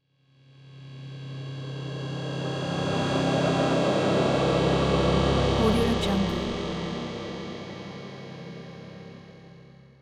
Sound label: ominous atmosphere